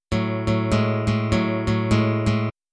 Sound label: b5 to b6 shuffle over a; 12 Bar Blues